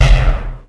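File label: pickup, items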